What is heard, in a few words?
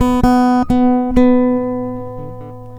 Guitar, Trip-Hop Loops; Sonic Foundry; Rondo Brothers Trip-Hop Loops & Samples; Hooked On Hookers